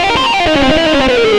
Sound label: xtra lead